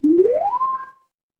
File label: feedback success